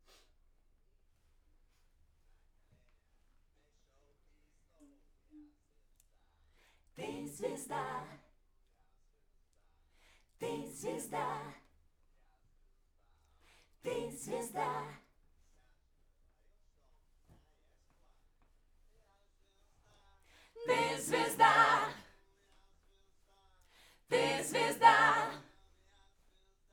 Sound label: Backs; SCHOEPS